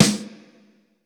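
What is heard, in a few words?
GATE SNARE; Crypton - DrumBase5000; GATE KITS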